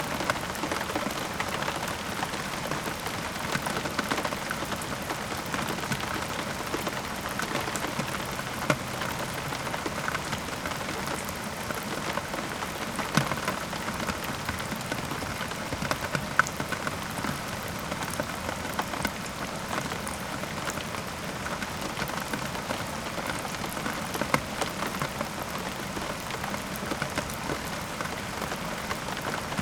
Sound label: Gutter Rains